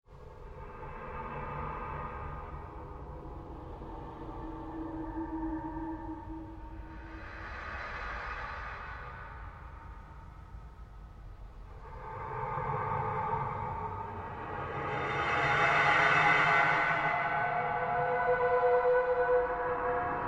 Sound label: Created Ambience Sweep Loop, Sweeper Sound Effects; Sound effects created by manipulating other sounds